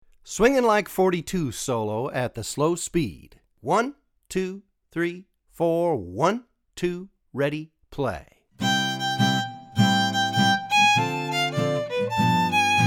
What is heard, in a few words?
Solo Slow, swing jazz; Solo at a Slow Tempo; Swing Jazz Violin with Hot-Club Rhythm